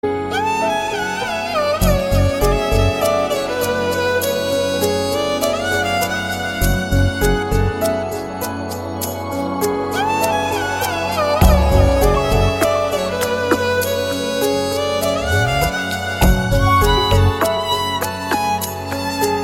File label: ringtone sad; zang gooshi